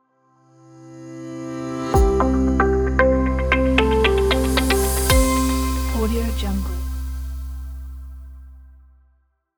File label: elegant logo reveal